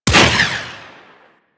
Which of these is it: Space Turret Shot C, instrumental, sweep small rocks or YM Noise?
Space Turret Shot C